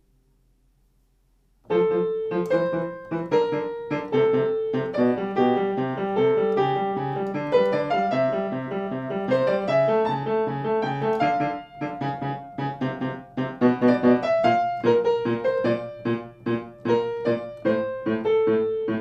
Piano; Airship

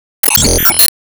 Team Skill Tap

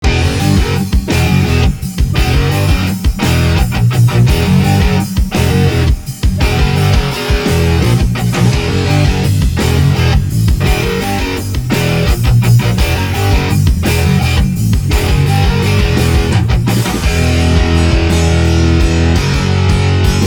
BG Music

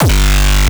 Speedkick 353 G

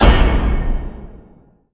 Enemy Hourgrass Active Loop; Disney Star Smash; Android, iOS, Mobile

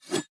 Swing Spear Short

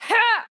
Swipe; Jackie

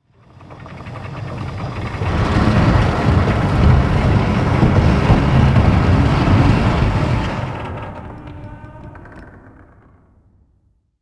elevator

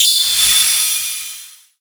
Enemy Jet Skill Shield Open; disney star smash; android

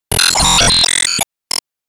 Player Change Cutin

